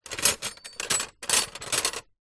household stainless steel cutlery movement in drawer; zapsplat